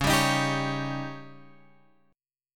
C sharp Suspended 2nd Flat 5th C sharp Guitar Open G